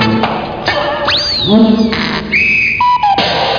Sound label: spike